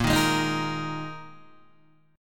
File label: A sharp 7th Suspended 4th down Guitar DADGAD